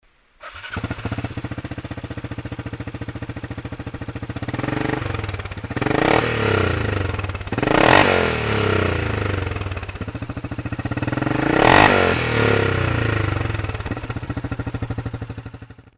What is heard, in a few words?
yamaha viking sound, exhausts; hmf